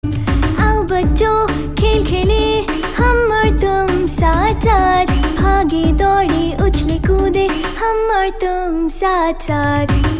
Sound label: Kids Have Fun, Hindi Kids